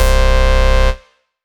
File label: Bass C, Offbeat Bass